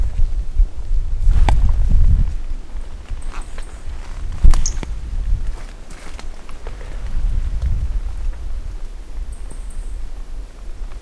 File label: Brachycephalus mirissimus individual 20-50 cm first note missing; M R Bornsch